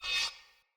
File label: Sphere Polearm Whoosh Accent; Weapons; Player